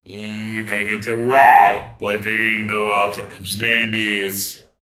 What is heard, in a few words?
a person training with a